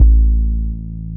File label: DR. LOOP, BASS; X-Static Goldmine 3